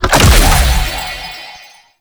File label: Treasure Box Clone Spawn; Warframe; ManInTheWall